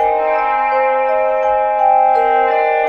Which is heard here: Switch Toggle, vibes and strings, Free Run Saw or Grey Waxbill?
vibes and strings